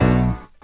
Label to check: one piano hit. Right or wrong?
Right